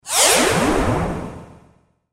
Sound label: Sci Fi Descend, Sci Fi Descend Whoosh Superhero Sci-Fi; Cartoon; Cartoon Sound Design